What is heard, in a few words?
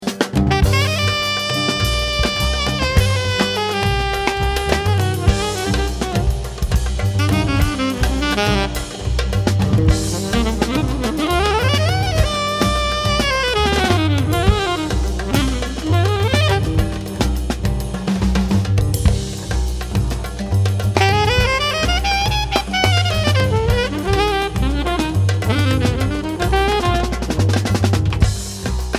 Solo